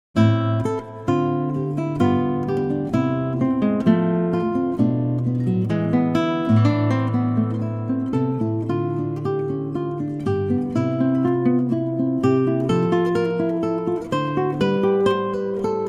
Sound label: Gavotte